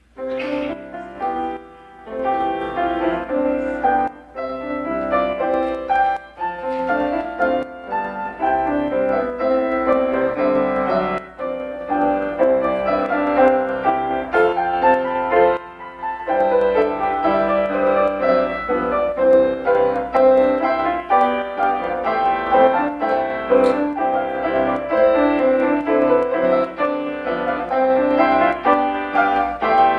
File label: Special Piano Duet